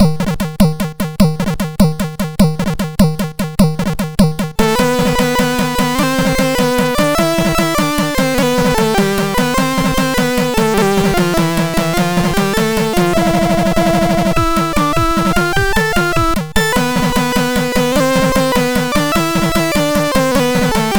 Medieval Song sid MOS6581R2; hvsc

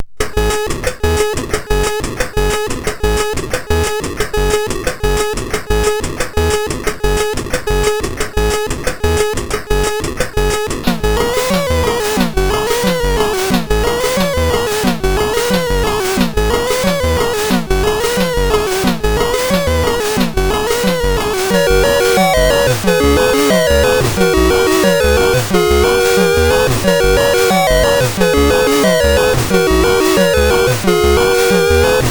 High Energy, MOS6581R4; sid

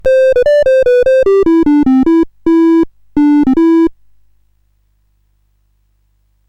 CSG8580R5, GAMES; Sammy Lightfoot

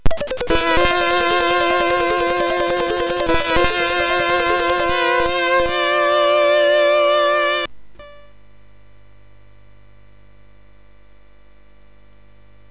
sid, MOS6581R3; Operation Wolf; hvsc

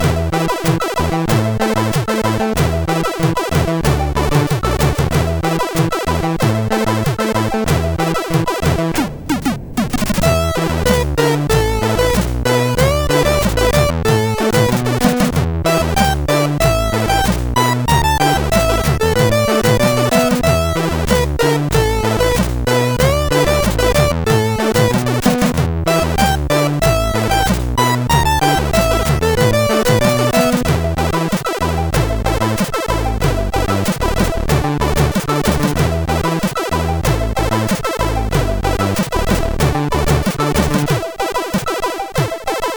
Disco Bass, sid MOS6581R4

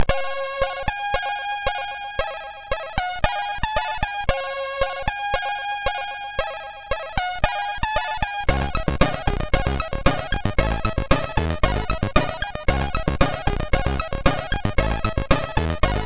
Sawtooth Only, MOS6581R2 sid

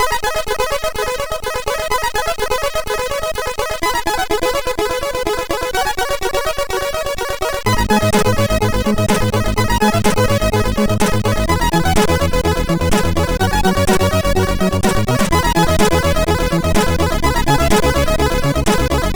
Techno, sid MOS6581R4

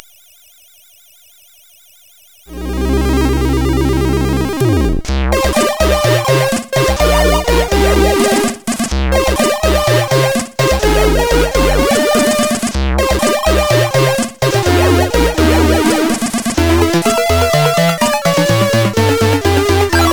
Wasted Lands, CSG8580R5; hvsc

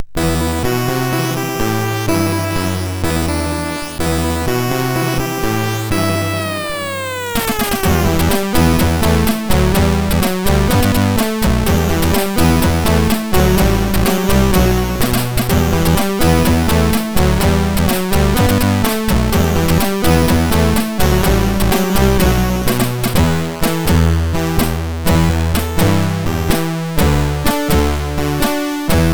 MOS6581

